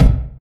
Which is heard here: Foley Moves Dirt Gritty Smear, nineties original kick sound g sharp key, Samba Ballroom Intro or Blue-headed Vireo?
nineties original kick sound g sharp key